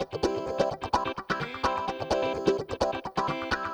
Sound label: Electric Guitar - C maj, 128BPM; Vengeance Samples; VEH3 Electric Guitar Kit 2